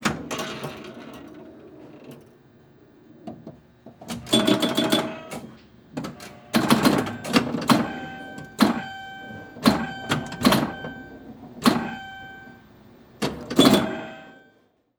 Technology Electronic Pinball Machine Retro Machine Played